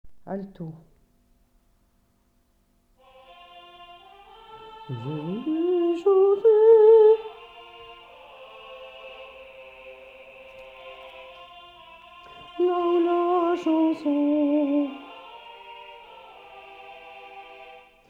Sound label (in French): La Ballade Alto voix